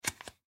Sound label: Deodorant Twist Up; Primping; Human; Household; Blastwave